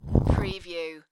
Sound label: Stone Drag - Rock Grind - Short